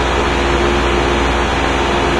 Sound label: train engine idle; Source Engine; tf